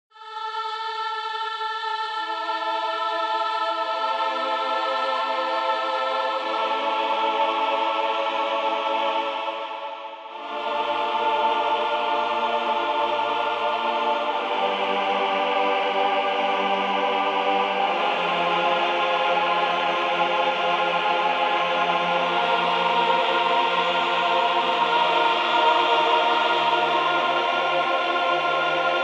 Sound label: Combinator Artificial Choir, Reason 3.0; Propellerhead Reason 3.0